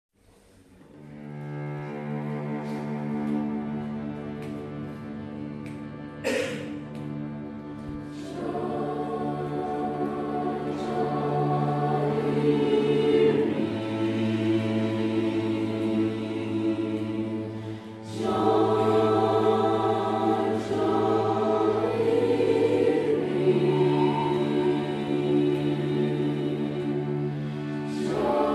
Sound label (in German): Windhund und Chor Andachtsjodler